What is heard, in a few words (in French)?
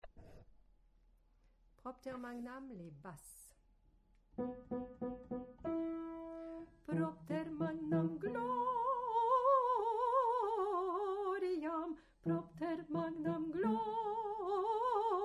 Basse; Vivaldi - Gloria - Propter Magnam